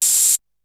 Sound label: Open hat; ZERO G - Datafile DISC 3